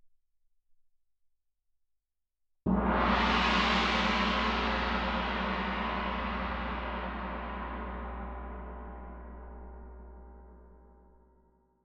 Tam-Tam, Jazz for piano

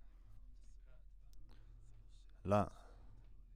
Voc OD

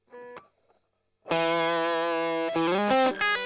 Strum Line

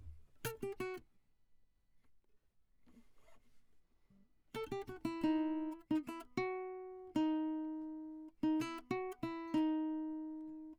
Classic Guitar

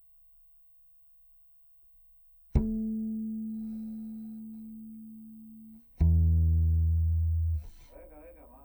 C Bass DI

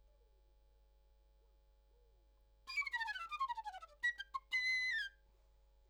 Flute, RNR for piano

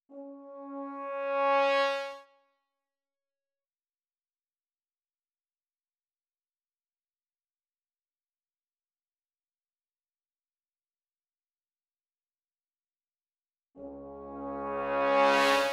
brass

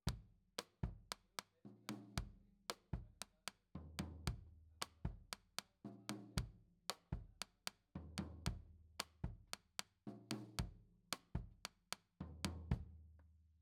Kit

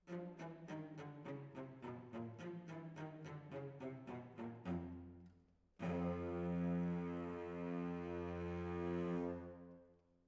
Decca, Jazz for piano

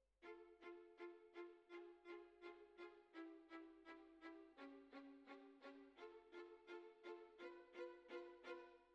Violas Over

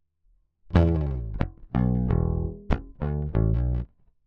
Bass

